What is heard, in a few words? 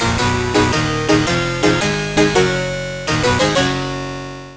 jingle; MED; Addams Family